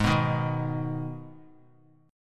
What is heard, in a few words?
Ab Minor Ab down; Abm strummed; JGuitar